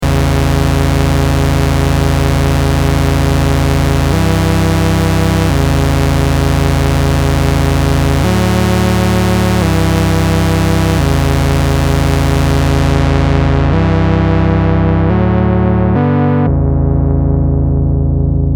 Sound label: Roland SE-02 Saw 3x Osc Filter